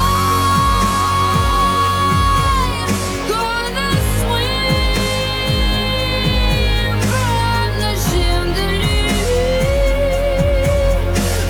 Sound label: Pop Voc